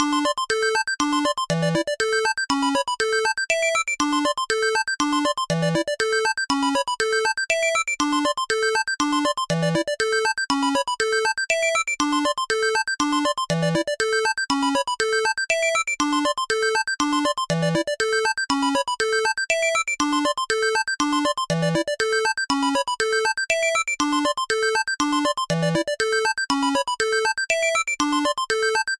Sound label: Chips Ringtone